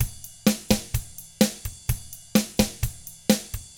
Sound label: Double Platinum Drums, surf rock; ILIO; Double Platinum Drums 1